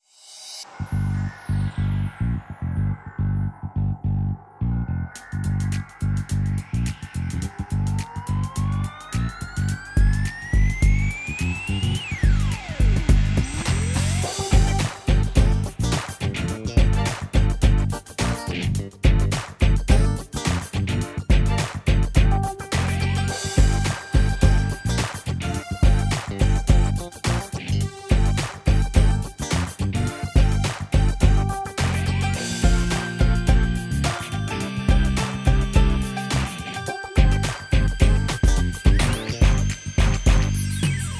Urbanistic

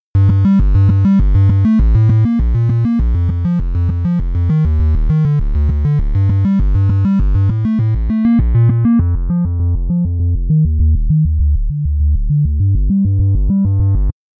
Auto Trance

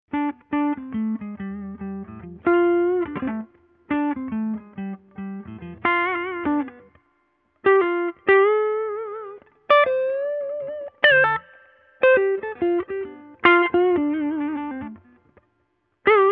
prs vintage rat tune

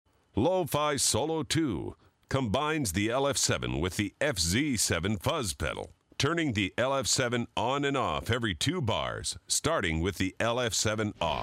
Lo-Fi Solo

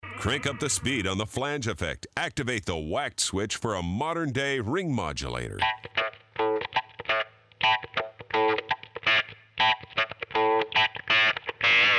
Ring Modulator

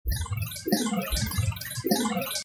delay drum

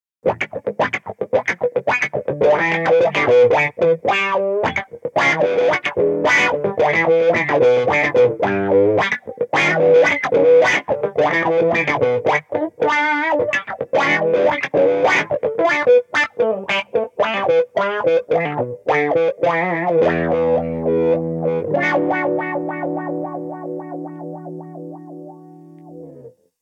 crybaby

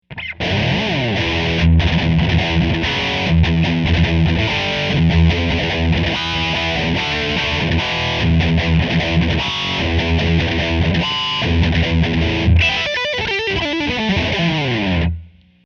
diamond plate

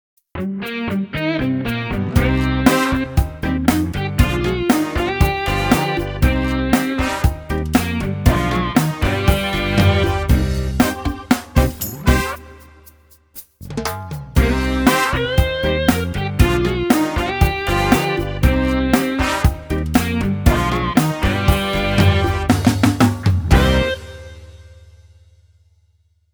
Latin Rock

